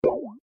request; animal jam

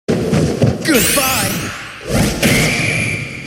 staff gun

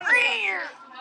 furry growling

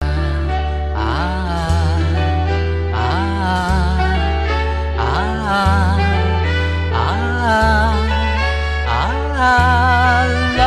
aha ha aha

